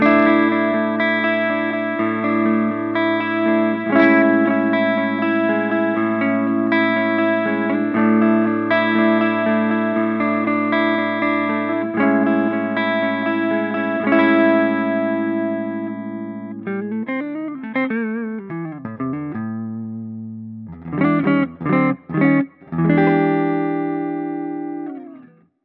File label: Harley Benton DNAfx GiT Pro US Deluxe Humbucker